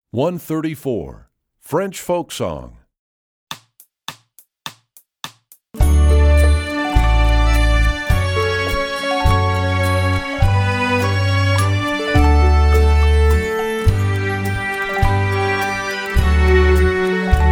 French Folk Song, strings; EE2000 Book2

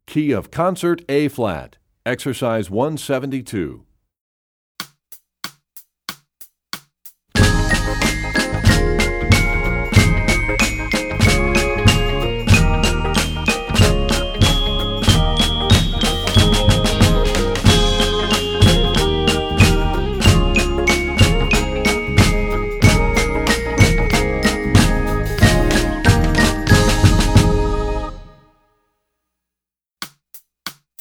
Concert Ab, Percussion; Rubank Studies; EE2000 Book2

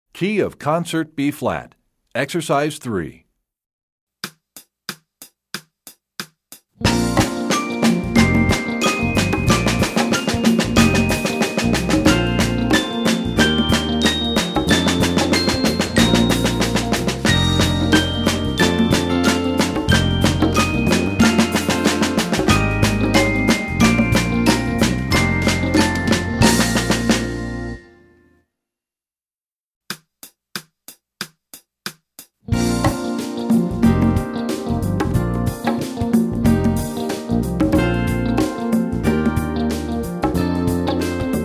Concert Bflat, Percussion; EE2000 Book1

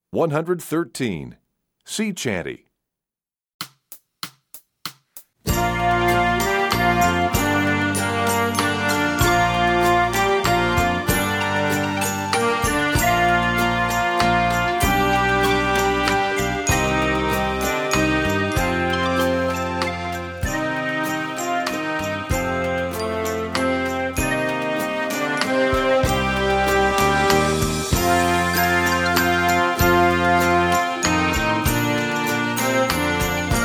Sea Chanty, Brass WW; EE2000 Book1

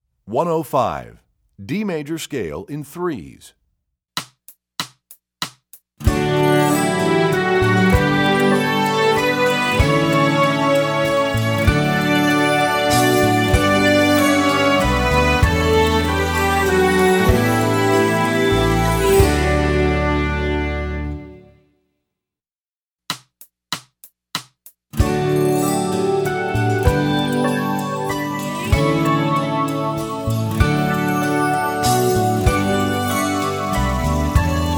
D Major Scale, Strings; EE2000 Book1

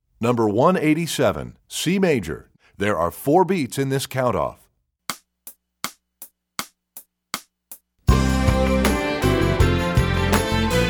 C Major, Strings; EE2000 Book1

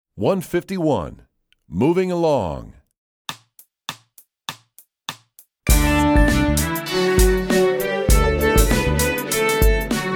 Moving Along, Strings; EE2000 Book2

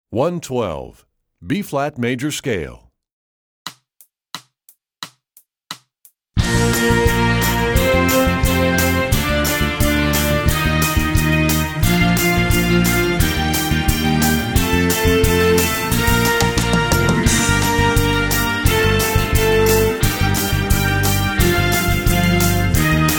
B flat Major Scale, Strings; EE2000 Book2

